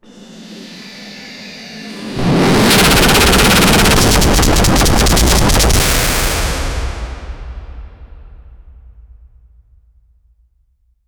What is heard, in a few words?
the sound of a furious, animatronic